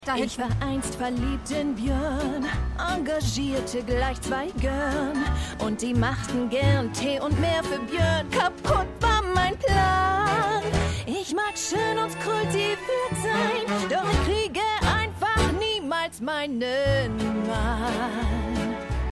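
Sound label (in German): Gräfin Gesang; Schmigadoon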